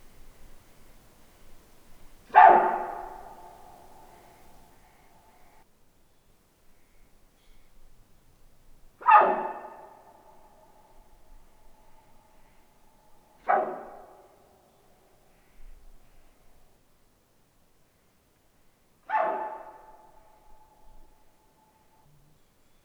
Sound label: Cervus elaphus sibiricus female alarm; Siberian wapiti; Sequence of loud oral barks – female alarm calls toward a spotted researcher